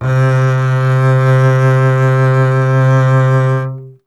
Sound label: STR CBS, Solo Instruments